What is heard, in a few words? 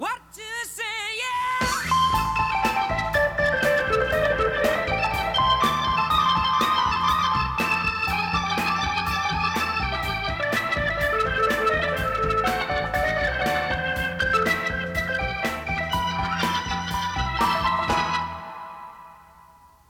Keyboards Solo; Piano/Organ Solo, plus Bass Guitar